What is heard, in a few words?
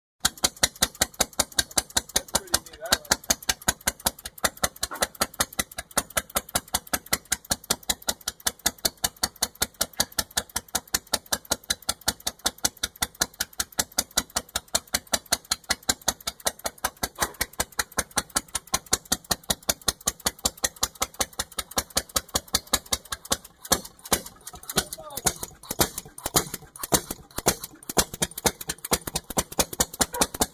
1900 Lathrop One Lung Marine Engine